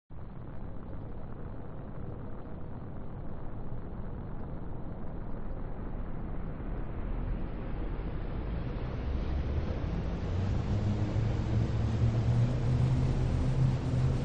space shuttle noise; lukas tvrdon

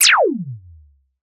science fiction laser; sound effects one

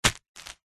foley footstep single on plastic sheet

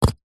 foley brick set down ground; zapsplat

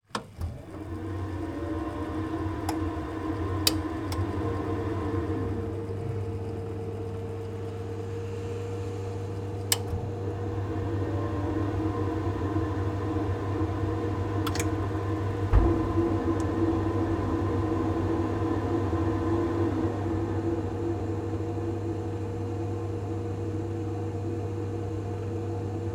boiler combi on fire up run; zapsplat

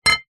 metal pole small set down wood floor; foley

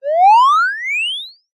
cartoon rise grow increase whistle

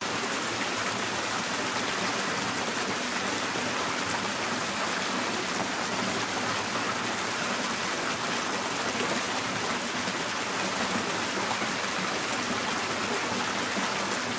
millwheel water splashing loop; the sound pack tree

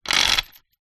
industrial chain metal medium pull over metal

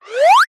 cartoon ascend whistle air; zapsplat

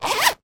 handbag zipper; foley

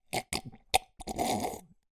human slurp drink from cup with gulps

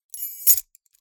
Keys Throw Up and Catch in Hand

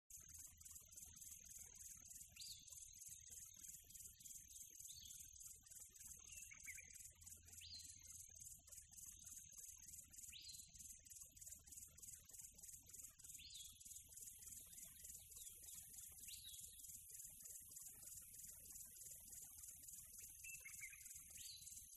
ATMO NATURE Quiet Tropical Birds Atmo; smartsound

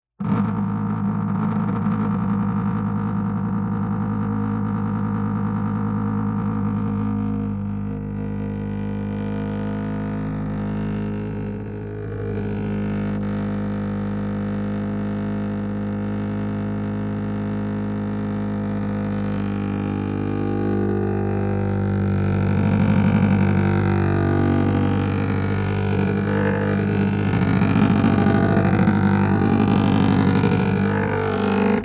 wood hollow vibrate fast object; foley; zapsplat

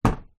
footstep single metal; foley; zapsplat

